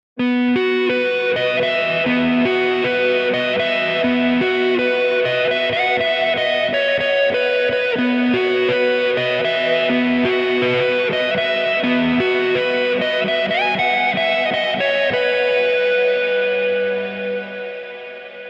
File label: X-SPACE ETHEREAL; xgear